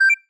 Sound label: accept, buttons